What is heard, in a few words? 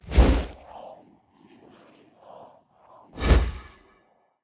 alteration draw sheathe; skyrim